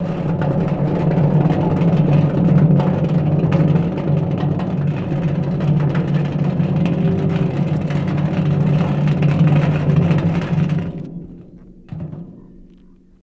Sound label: Boulders Scraping, earthquakes